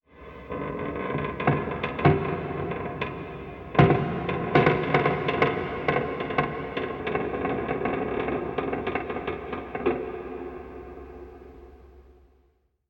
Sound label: soundscape metal creak